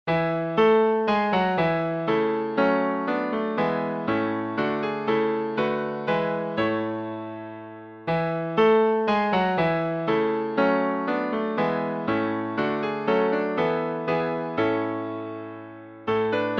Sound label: Hymns